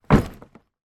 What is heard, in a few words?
suitcase large down on surface; foley; zapsplat